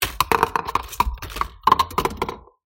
Throwing That Shit Plastic, Foley; TRUTH-HZ; Crowdsourced Sample Pack

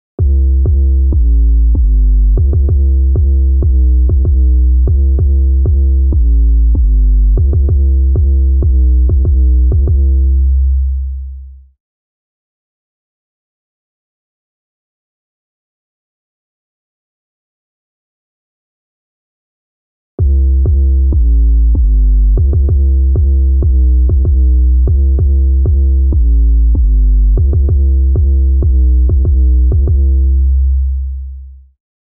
808 96BPM; SOLO LAYER; Crowdsourced Sample Pack; Drum Kit; 808s & Subs

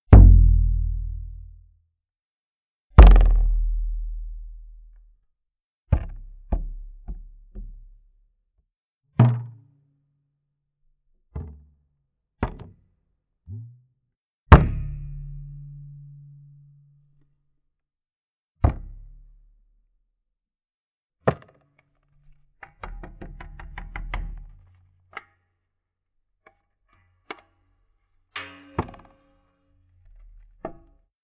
Toy Drum Snare; Crowdsourced Sample Pack; Drum Kit; Claps & Snares

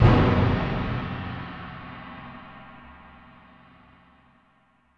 Gong Hit; Hits; Crowdsourced Sample Pack; TRUTH-HZ

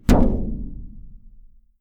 Toy Drum Kick; Drum Kit Kicks

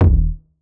HARD KICK; Drum Kit Kicks

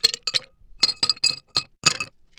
mr surf ice, Foley; TRUTH-HZ; Crowdsourced Sample Pack

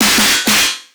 DNB Metal Snare; Crowdsourced Sample Pack; Drum Kit; Claps & Snares

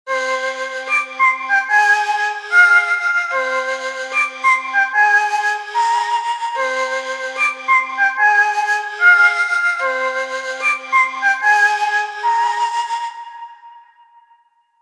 flute 148 bpm, Brass & Wind; Crowdsourced Sample Pack

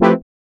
Microkorg SP404 Stab; One-Shot Synths; Crowdsourced Sample Pack; TRUTH-HZ

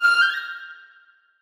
string, Hits; Crowdsourced Sample Pack; TRUTH-HZ

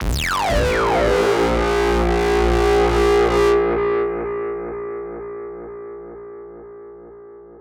Pommelmachine 126bpm; Crowdsourced Sample Pack; Digital FX

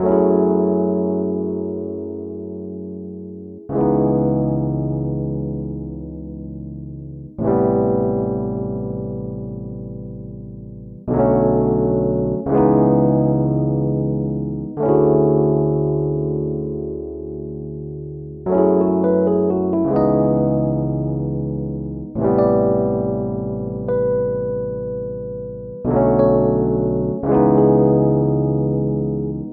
jams, 130bpm, Melody Loops; TRUTH-HZ; Crowdsourced Sample Pack

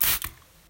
Winded, Foley; TRUTH-HZ; Crowdsourced Sample Pack

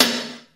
80 SnARE; Crowdsourced Sample Pack; Drum Kit; Claps & Snares

